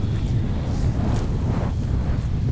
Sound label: blink void, hud